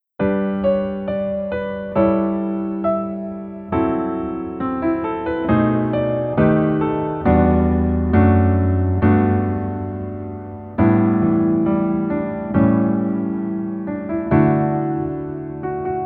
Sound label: Piano